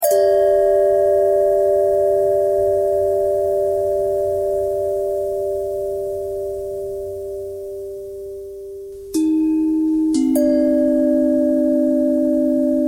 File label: bell thread